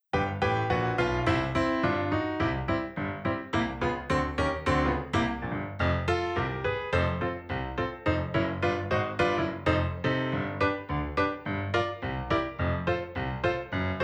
piano

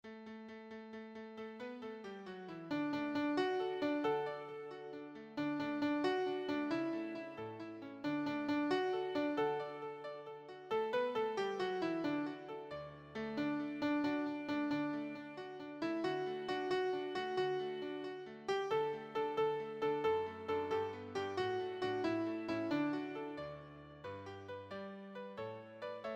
Here We Go Looby Loo Melody

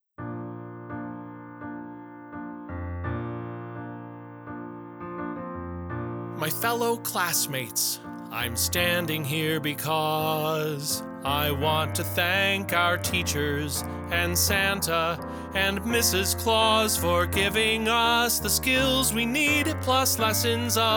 guide vocal

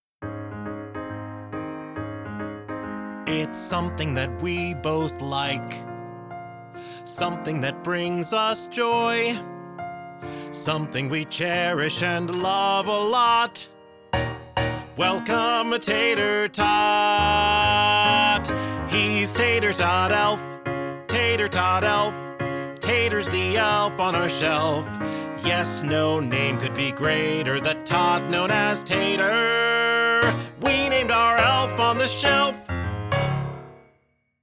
guide vocal LOW

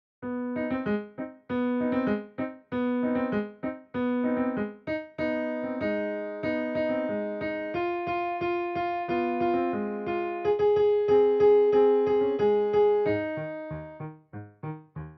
Melody

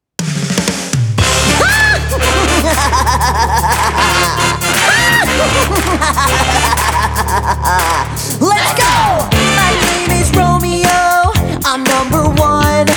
Theme Song with vocals

